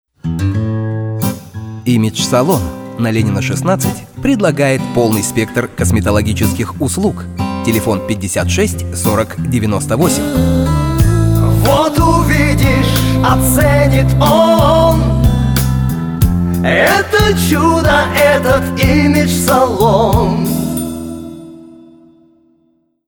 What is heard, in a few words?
image salone jingle